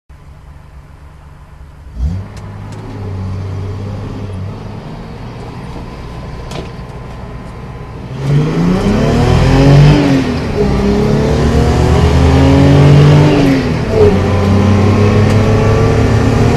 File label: new 240z exhaust